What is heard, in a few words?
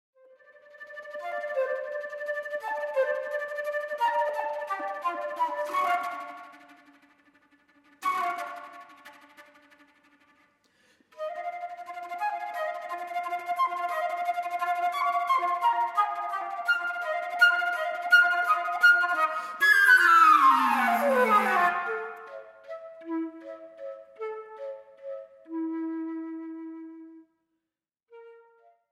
contemp fl